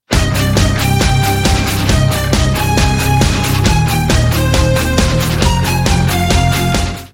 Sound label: Industrial Metal Msg